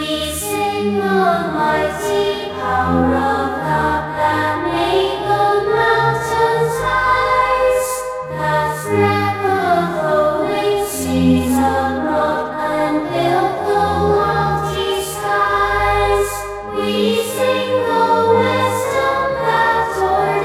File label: choir